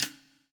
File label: POWER RIM